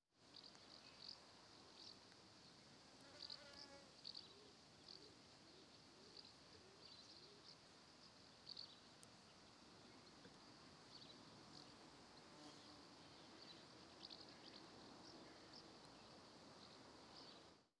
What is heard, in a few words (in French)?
Friche Industrielle insectes Et Pépillement; Rhinau